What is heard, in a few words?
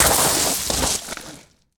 gravel fall; firefight